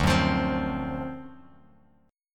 D 7th Sharp 9th, Bass Standard; 10,9,15,17; strummed; JGuitar

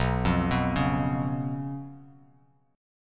B Suspended 2nd Flat 5th; arpeggiated; JGuitar

